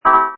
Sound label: Transit Error, Servo Panel Sound; Button Sound Effects; Multimedia